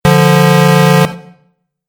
Truck Horn Short Blast; Truck Sound Effects

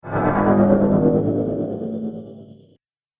Subtle Chopper Whoosh; Whoosh Sound Effects

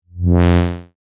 Synth, Stab, Fat; Stabs; Sound Design

